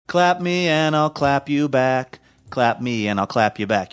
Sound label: singing clap me and i'll clap you back